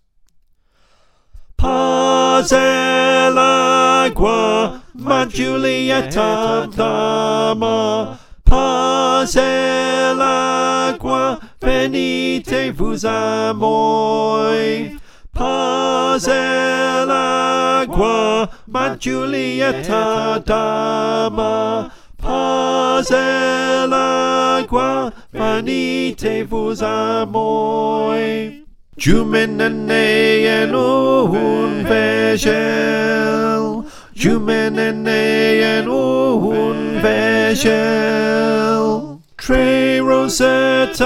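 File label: Tenor, choir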